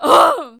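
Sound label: female zombie hit; Project Zomboid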